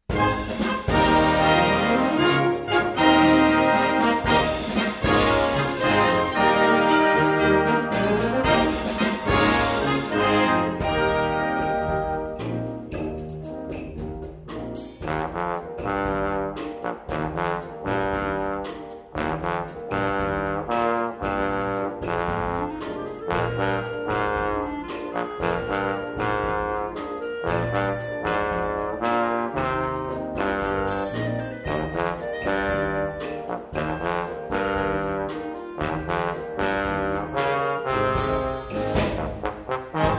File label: Bass Trombone, Wind Band; A challenging solo for bass trombone